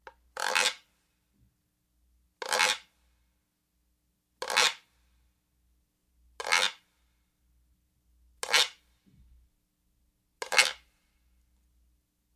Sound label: Guirro Sample Stereo Latin Percussion Sample 120BPM Loop